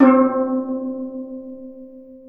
C3 TENOR, STEEL DRUMS; AKAI S6000 CD-ROM; WorldSounds

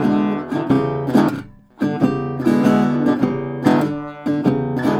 Blues Guitar Samples, riff

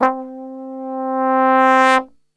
C, Brass; Best Service; Brass Super Section

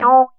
GUI MULT; EastWest; Dance Mega Hip Hop Electro

